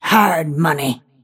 last hit pain; snapfire

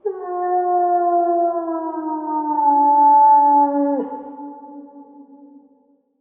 wolf; dota; ambient; soundscapes; diretide

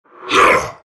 grunt; terrorblade

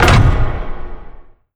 pick repick, ui menu

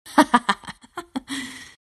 lina laugh; dota

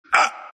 embr pain; dota; ember spirit

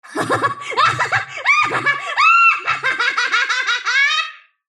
wheel laugh; dota; dark willow

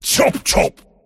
attack pain; dota; pudge

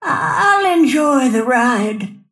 move pain; snapfire

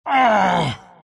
pain; dota; omniknight

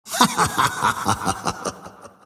crown laugh; dota; monkey king

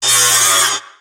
tango cut; dota; shredder

